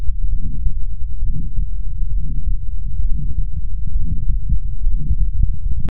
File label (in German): Aortenstenose